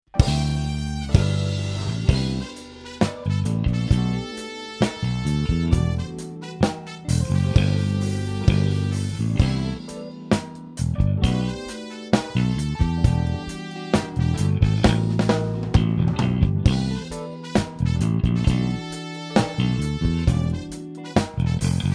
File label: instrumental